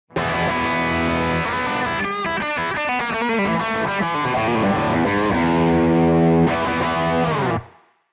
guitar Hum

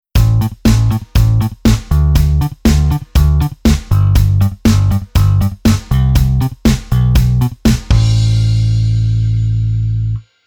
Bass Arr Oct

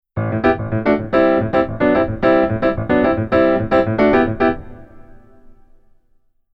Keyboard

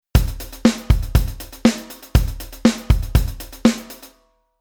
Rhythm Basic

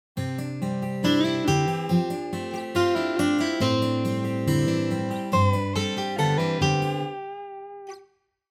guitar Lead midi

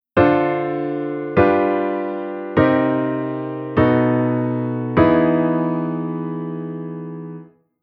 Chord Modulation Ext V7

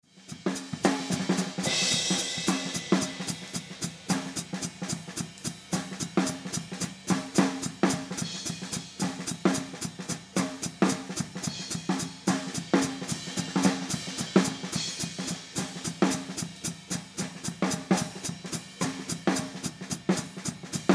Drums HH Ride HH

